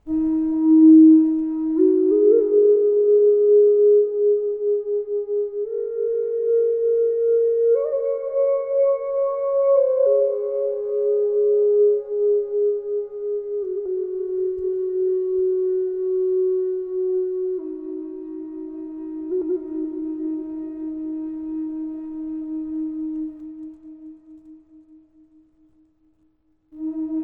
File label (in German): Drone Flute Doppelfloete E 432 Ahorn mit hall; e 432hz; indianerfloete